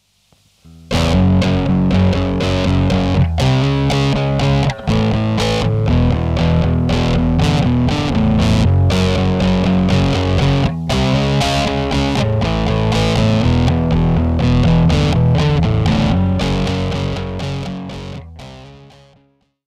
cabinet

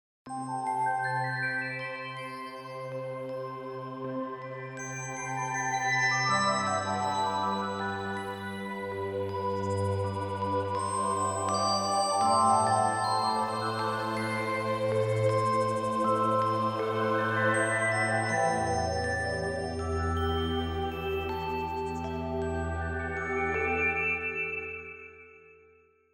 Gossamer Wind

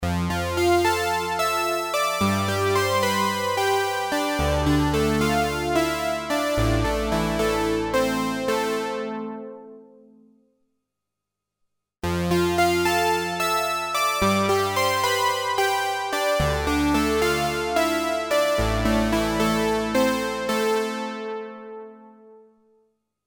Chorus

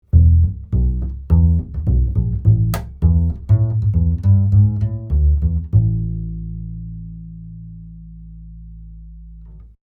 Schoeps MK4 Upright Bass

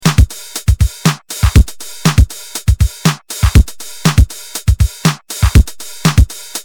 tr Accent

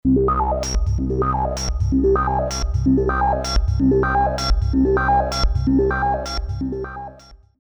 hydrasynth lifeforms

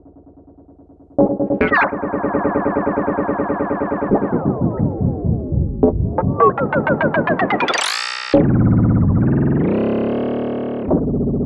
Moog Moogerfooger Plug Ins MF 104S Delay

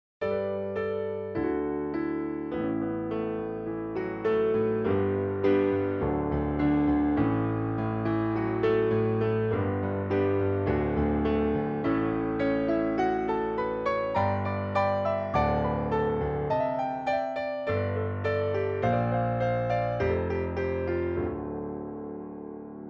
Piano

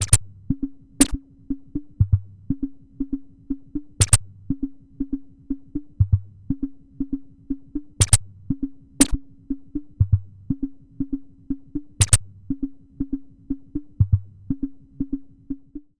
Dreadbox Drips V2

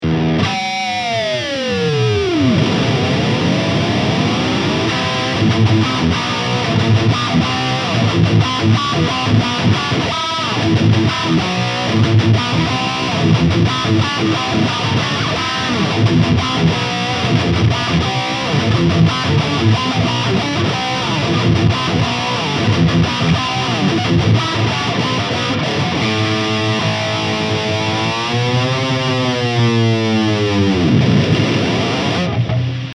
ESP LTD Eclipse ´87 Rainbow High Gain

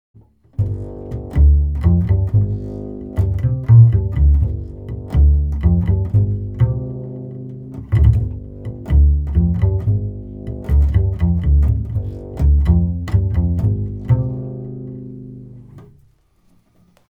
Upright bass Bridge 80 cm; sanken CU-44x Mk2

